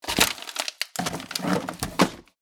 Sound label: Fishing tackle box